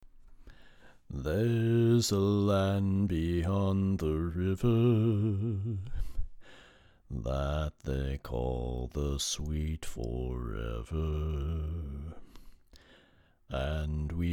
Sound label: bass; When They Ring Those Golden Bells; Pennsylvania Groups; NBC